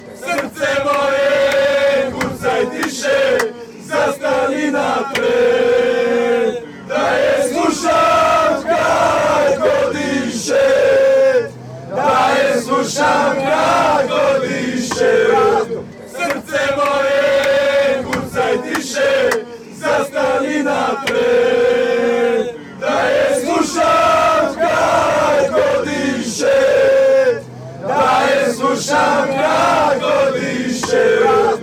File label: croatia chant, fan chants